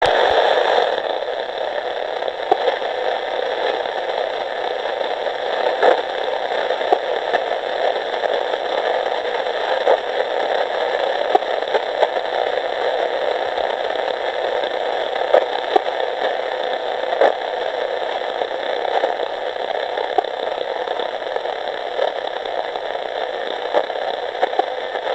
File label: radio noise; ラジオノイズ